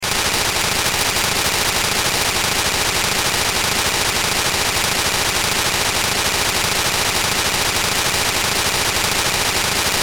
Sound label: Moto P25 Control; Motorola 700MHz P25 Control Channel; This is what a Motorola-built P25 system sounds like that is capable of both Phase-I (CQPSK-LSM) and Phase-II (H-DQPSK) audio.